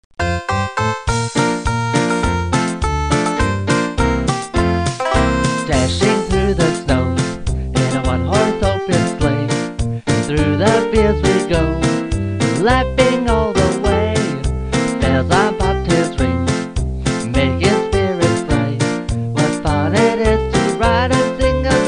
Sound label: Jingle Bells